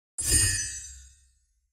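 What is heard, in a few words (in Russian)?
fea proshmygnula, fairy scurried by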